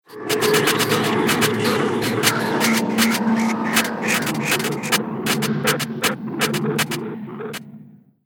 Impact Mass Destruction, impact / explosion sound effect; Magic Sound Effects